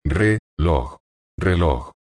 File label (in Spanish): Reloj